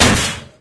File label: sentry upgrading, weapons; tf